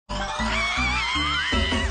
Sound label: scream; kemono friends; tsuchinoko